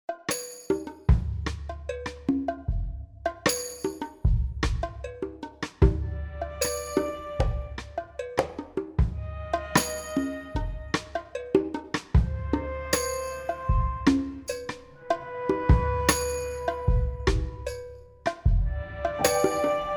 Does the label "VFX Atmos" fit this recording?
No